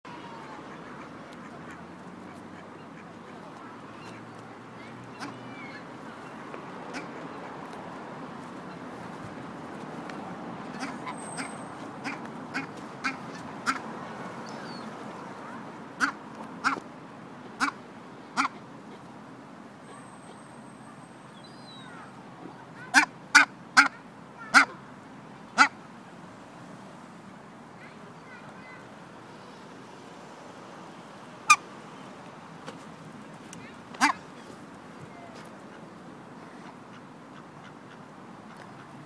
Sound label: lazy ducks at roath lake